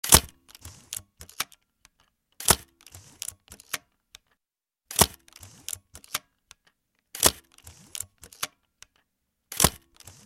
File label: MINOLTA X 700